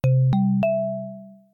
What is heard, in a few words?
notification